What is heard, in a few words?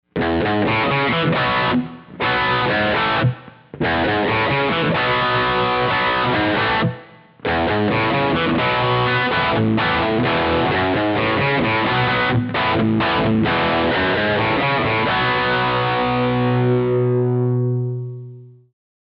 GT-10 Rythm